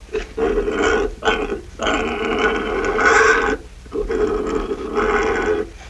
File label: Pig Multiple Grunts